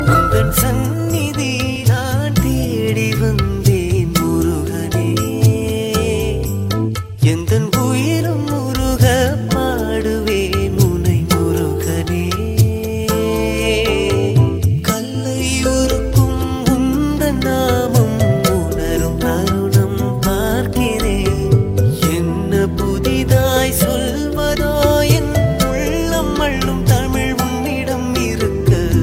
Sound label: Murugan Song